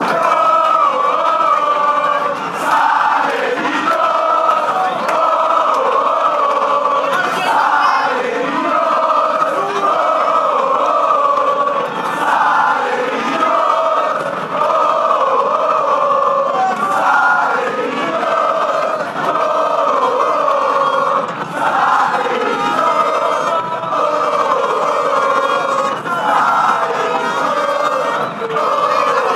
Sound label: millos fan chants; mfc